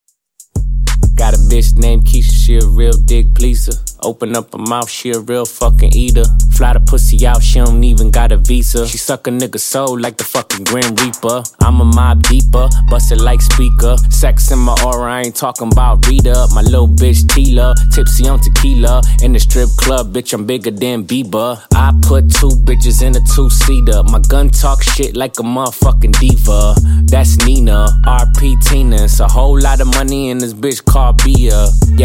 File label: Intro Outro, 95 Bpm